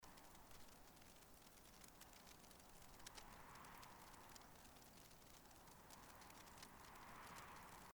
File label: Snow sound particle sound design; Créer le sound design d’un documentaire sur la neige… au mois de Juillet; Créer le sound design d’un documentaire sur la neige… au mois de Juillet, c’est le défi qu